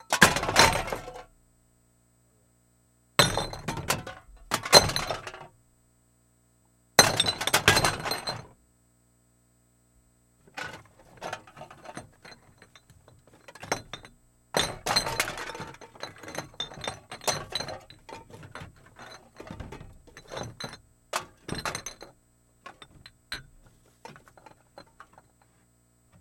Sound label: Bottles Thrown Into Plastic Trash Can